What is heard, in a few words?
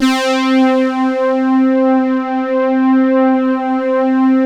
flat pad; Sound Gallery - Charged